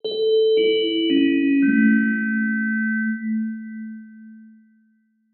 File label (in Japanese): お知らせ 終わり 遅め リバーブ有り; 戏剧音效